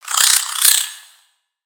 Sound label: Spider Dazed; Lego; creatures mechanical